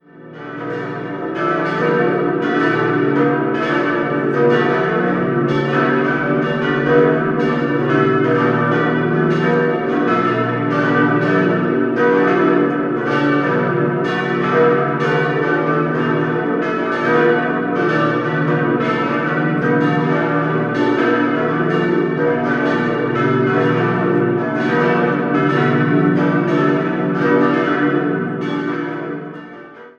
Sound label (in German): glocken; Bad Wörishofen